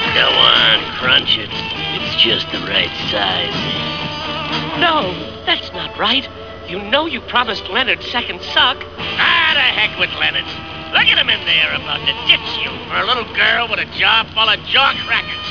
CRUNCH; Teacher's Pet

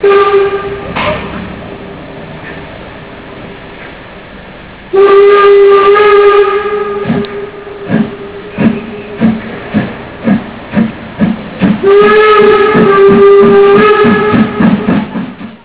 steam

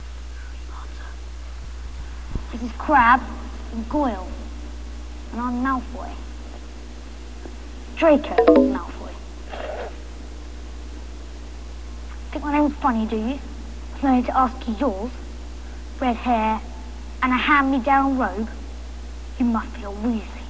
teehee; Malfoy...Draco Malfoy

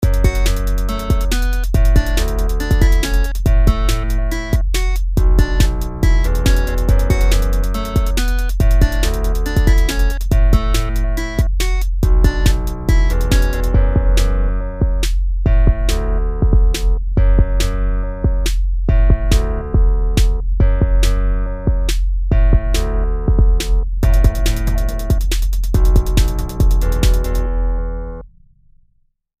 Guitar Beat

